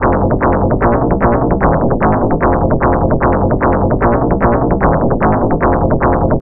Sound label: rich tex techno; nyquist